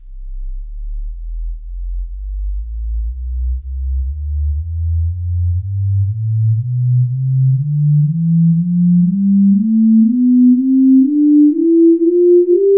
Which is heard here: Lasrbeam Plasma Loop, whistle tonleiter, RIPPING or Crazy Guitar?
whistle tonleiter